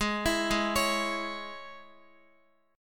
Ab Suspended 4th Sharp 5th Guitar, chord; Absus4#5 arpeggiated; x,x,6,9,8,8; JGuitar

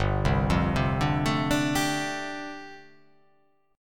G 7th Suspended 2nd Suspended 4th

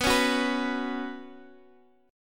B Suspended 2nd, Guitar, Open D

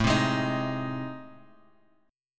A Minor Major 7th Flat 5th, Bass Standard; strummed; AmM7b5; 17,15,18,20; JGuitar

